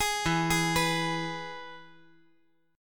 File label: E-Major E